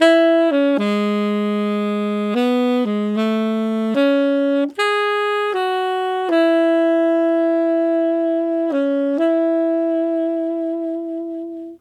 Tenor Ballad in A maj, Tenor Sax Loops; Sonic Foundry (Sony Creative Software); Jazz Solos and Sections

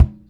EQ Perc, Percussion Mix

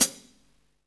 HAT ROOM, Hi-Hats, HH Modules; Roland L-CD701

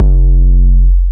LONG DP KK, TECHNO RAVE; Pro-Rec - Synthsonic

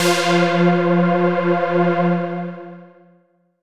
lead eldritch F; leads

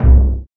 CYBER KIT, DRUMS

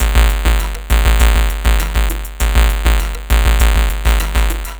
Robo Beat 100BPM, sci-fi Beats

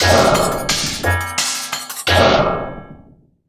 Industrial Loops; USB Soundscan vol.24; AKAI

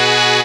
MISC, Skanky Perc; E-MU Formula 4000 Series Vol. 1; Hip Hop Nation

